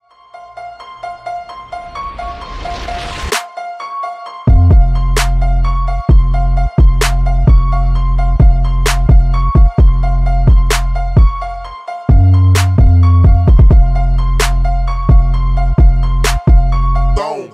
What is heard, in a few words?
trap remix